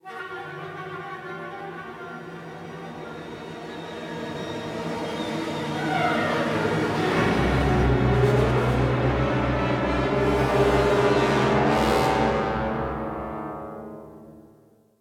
Sting Shell Break; Necropolis

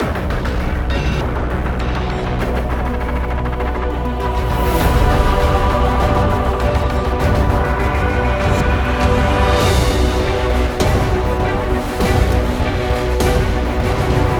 Waves Fight

